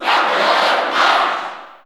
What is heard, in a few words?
Cheer English; Captain Falcon; SSB4; SSBU